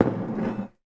household whiskey decanter glass put down table slide